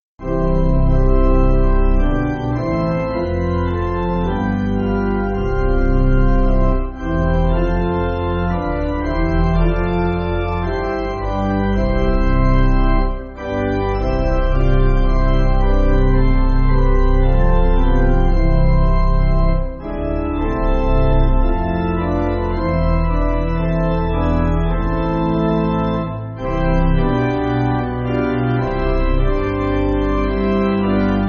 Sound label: Pipe, Pipe Organ, Music Backing